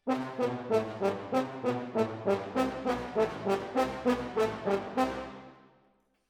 Horns Over

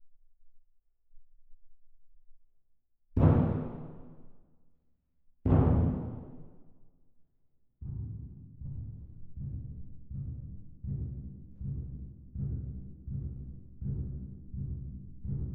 Twin R Perc

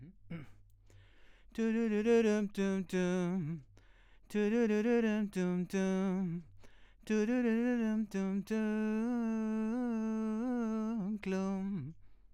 VOX

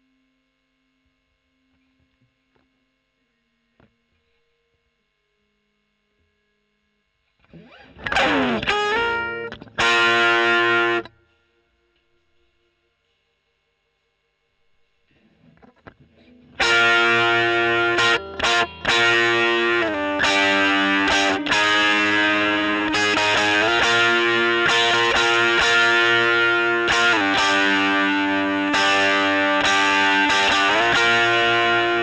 E.GTR Gefell OD

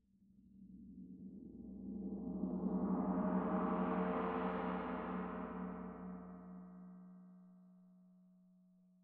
Tam-Tam Close

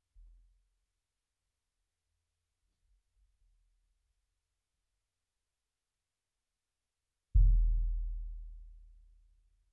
BD In

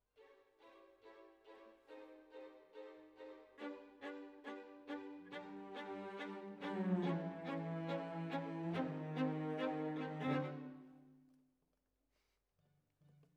Violas, jazz for piano

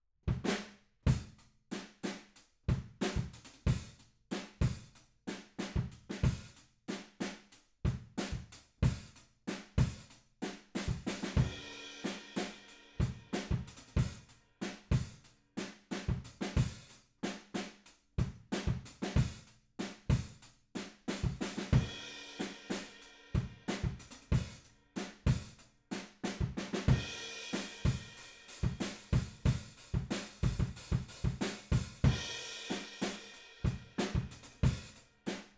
Room

